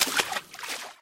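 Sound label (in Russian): короткий всплеск от брошенного камня в море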